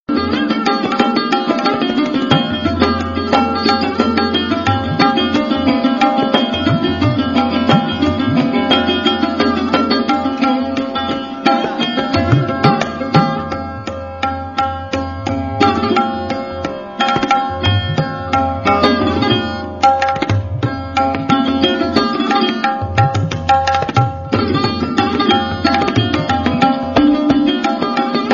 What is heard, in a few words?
sarod music; Indian